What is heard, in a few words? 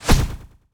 Tech Whoosh